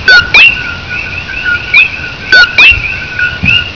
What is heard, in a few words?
1 frog short, Alien Species In Hawaii